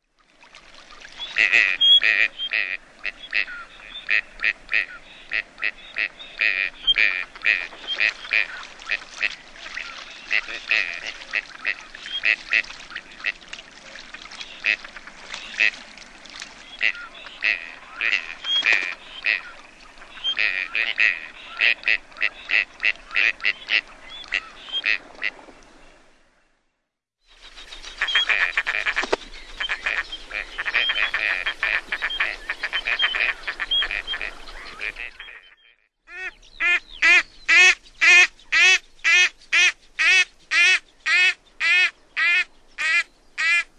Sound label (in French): Canard chipeau, birds